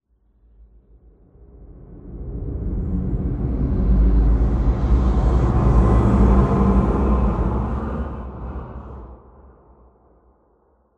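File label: ambience lobby; Half Life Alyx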